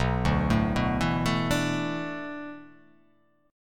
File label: B Minor 11th B down